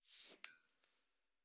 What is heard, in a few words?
payment sent